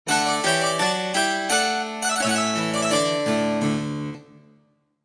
England Music Short; Civilization Revolution

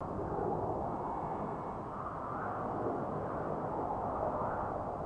Ambient Sound; Pangya; Fantasy Golf

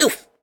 pain; En surfer pain2; Fight Knight

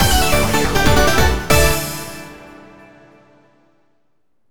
Jingle - Quest; Dragon Ball Z: Kakarot

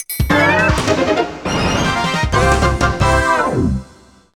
Goal Jingle; Speed Racer

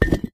Shield Desert Collect; doodle jump 2; android

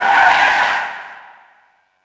Skids Shortest

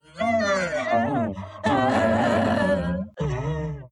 enrage short

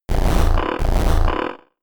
Snore

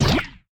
Necro Luminant Bolt Shoot

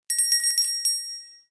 Door Clock; Granny 3; Android

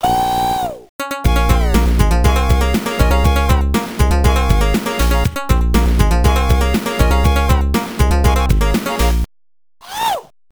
Dance Attack (with voice); Michael Jackson's Moonwalker Genesis soundtrack

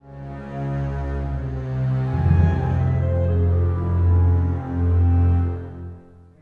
Suspence Sting

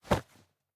Hiding Spot Exit Body; The Inn

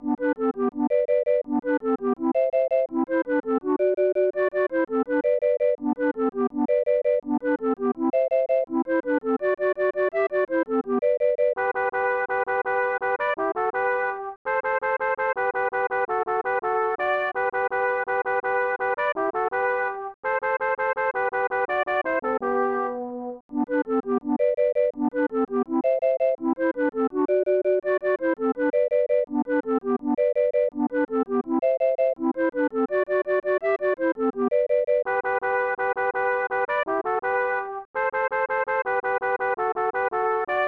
Jingle Bells; fun n games 1994 snes

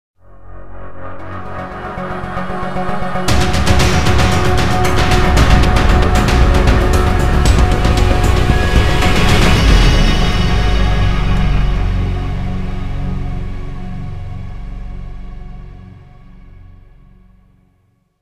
Multiplayer Start; modern combat 5 blackout; android; Ryogoku

